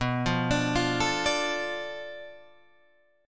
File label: B Minor 7th Flat 5th B, Guitar Open G